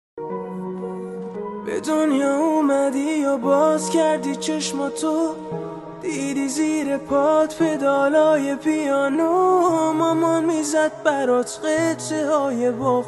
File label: piano